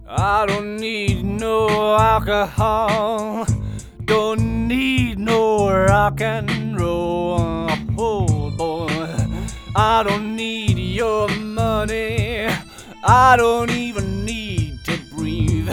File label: Speaker Foldback Cancel Pass Omni Vocal